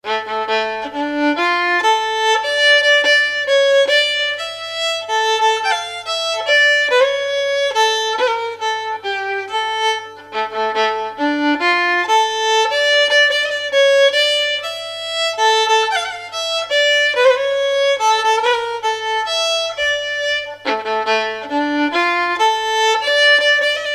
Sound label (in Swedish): Vals; Vals frеn Enviken